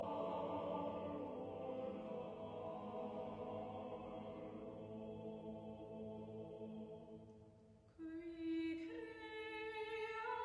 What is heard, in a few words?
carols